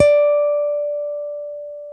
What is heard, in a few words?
STEEL, Guitars